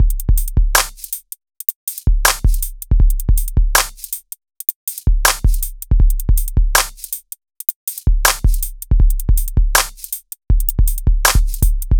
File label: Hip-Hop Drumloop